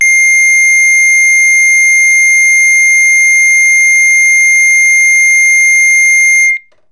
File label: Sounder